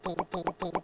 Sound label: Running; Go, Kizi, Go!; Running Snd Running